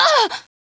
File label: Short Scream; Lewd SFX